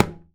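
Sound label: Percussion Conga; Drums; Acoustic and Electric; Polyend; Simulacrum Sounds; 1 Shots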